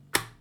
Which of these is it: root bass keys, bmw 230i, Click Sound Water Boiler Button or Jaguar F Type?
Click Sound Water Boiler Button